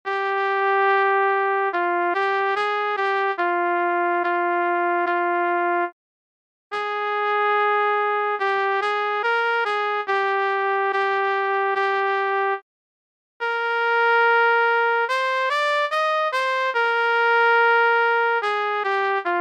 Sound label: trumpet